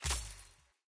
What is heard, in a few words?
target down; superbwarfare